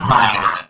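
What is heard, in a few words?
droid attack; Star Wars Knights Of The Old Republic I; XBOX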